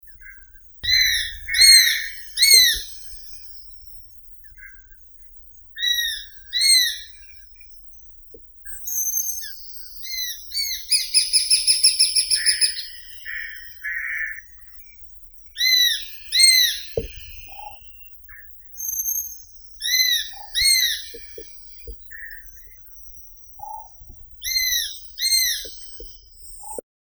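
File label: besra, Accipiter virgatus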